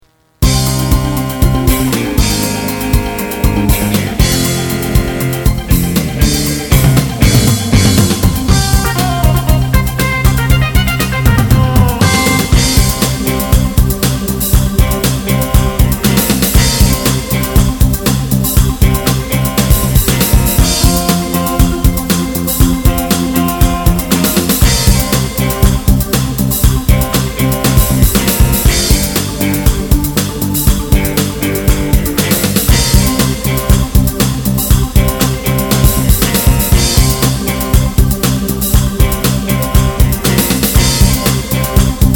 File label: background music